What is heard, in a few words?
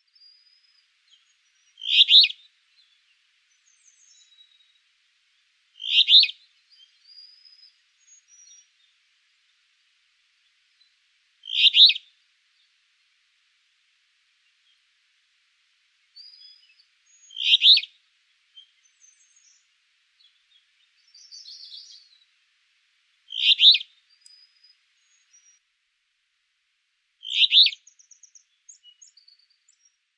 alder flycatcher